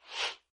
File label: boost; free rider hd